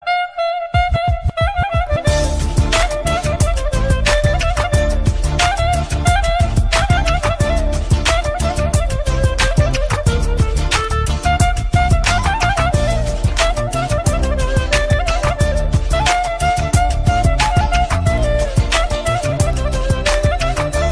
Klarnet Mix

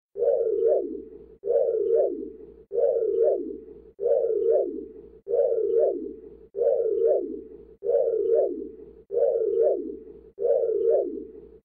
KITT SCANNER SOUND

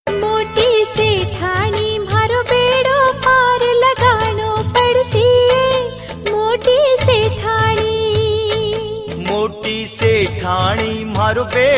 Bhajan; Dadi

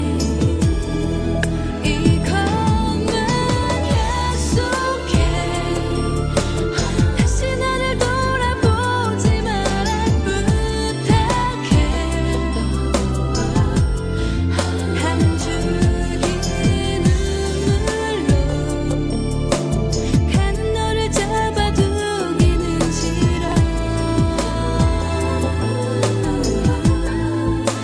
Affection

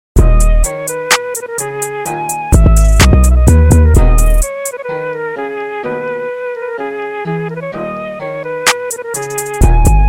Bop Instrumental

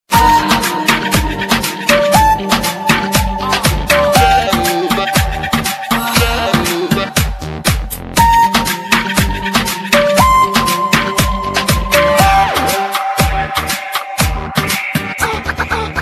Crazy Hip Hop

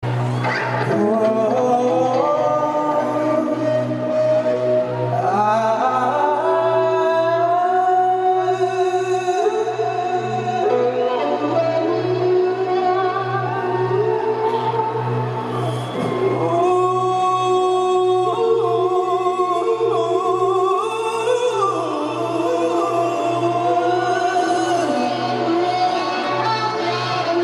Riffs

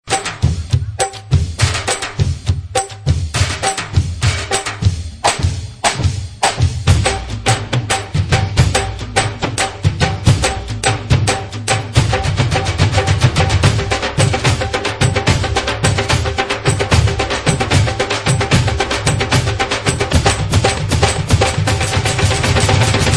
Drum Beat

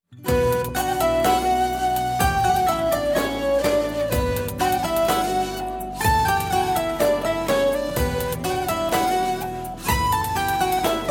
vestel ringtone

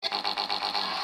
super fart; Kriby

